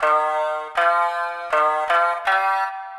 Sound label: ETHNO 160 C